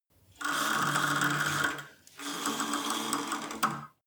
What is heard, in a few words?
cable machine old pull release, fitnessstudio; sport freizeit